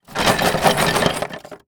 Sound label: Foley Rocks Metal Clamps Wood Styrofoam Plastic Tub Rattles